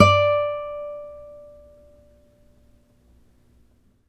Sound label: NYLON F D4, GUITARS; Synclavier Sampler Library